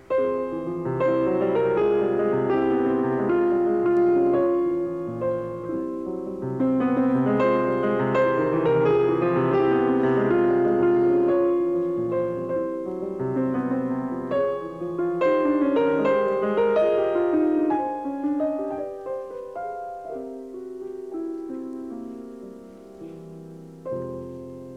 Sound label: Piano Sonata in F minor, Variation; Schumann; ("Concerto Without Orchestra"), Op. 14